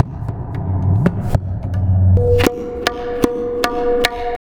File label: Tabla, 110 BPM; Zero-G; Pure Tabla